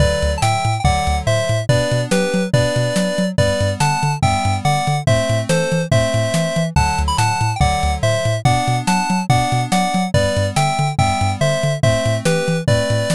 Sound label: bgm street